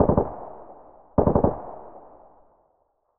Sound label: mutant burst double, weapons; SPT Battle Ambience